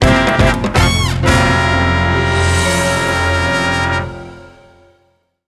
Mission Failed!; the simpsons hit run; ps2; Heavy Drinker

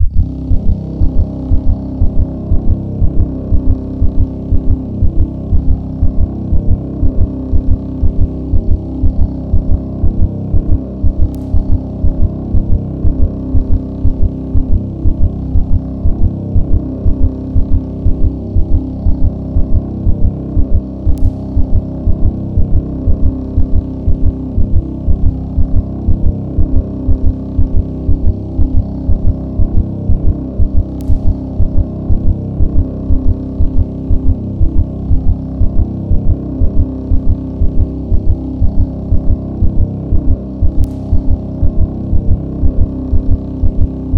Heart Hum Fast

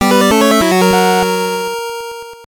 Unknown Jingle; Turrican; GB

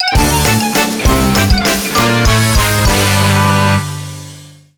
Jingle Lose; Super Monkey Ball Banana Blitz

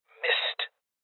Sniper Miss

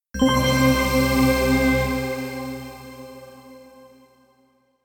Jingle Clear Bonus + Target Bonus

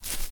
Footstep grass; this is the zodiac speaking 2020 gamerip switch ps4 xbox one pc macos

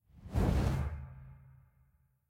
Character Swap; Devour

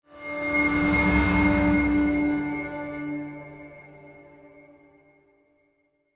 Eerie Stinger

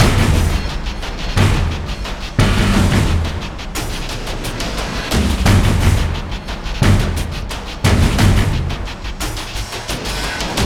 Drone Action Ambient; ios android; modern combat 4 zero hour; Red Summit

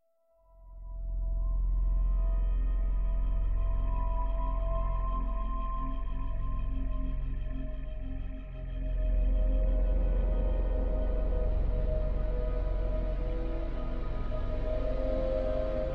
Ambient Pad

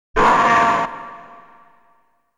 Voice of primitive man

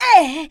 pain

